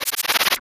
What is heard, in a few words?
Fly